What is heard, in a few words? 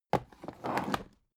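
aluminium case small handle drag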